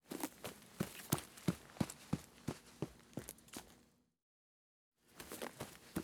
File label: Boots run by grass EXT